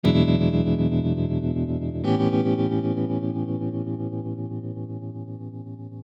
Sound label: Tremolo chords; ragajunglism